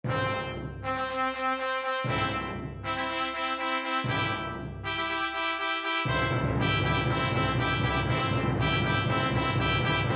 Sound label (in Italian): Interludio; Non presente come numero indipendente nella riduzione per pianoforte